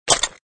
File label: btn; Gun Mayhem 2